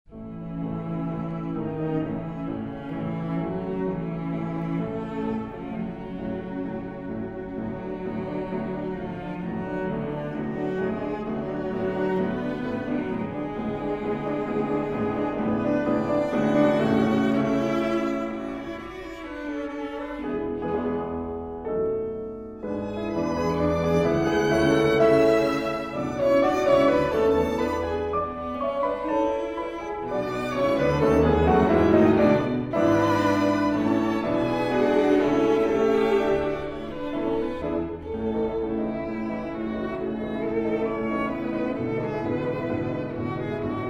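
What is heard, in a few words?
piano quintet; kiel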